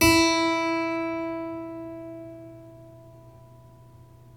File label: Clavecin E5, Harpsichord; Giga Samples Collection